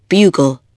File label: bugle